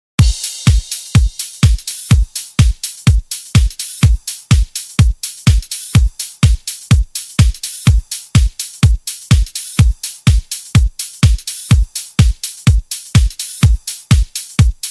sizzling beat; reggae

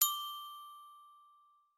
Ding; OnePlus2

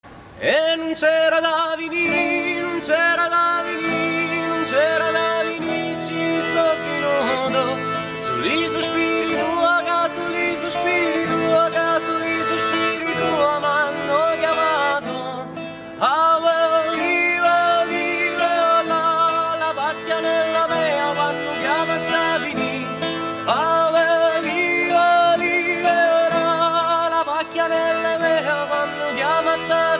Pizzica di San Vito

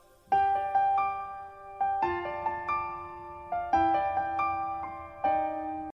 anime piano

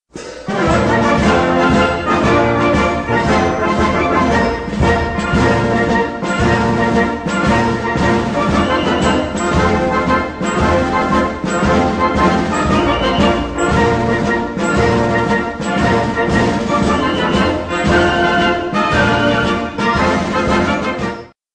german victory; games; ringtones

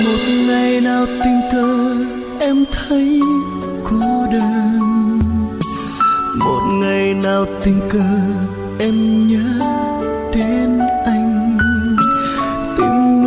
Funky

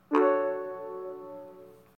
Notification; Groupme

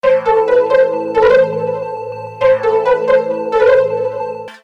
repeat ringtone

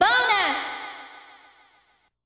victory

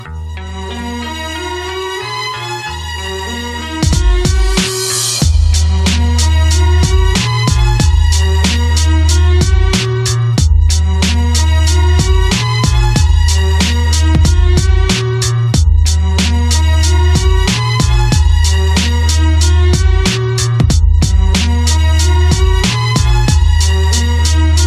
Boombap

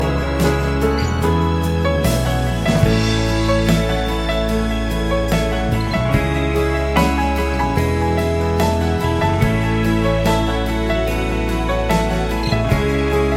iraqi piano player

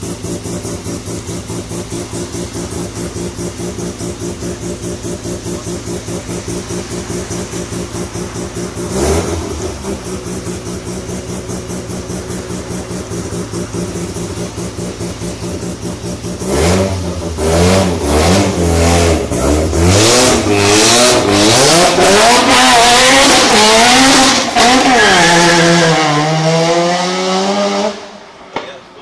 loud rx7 extreme

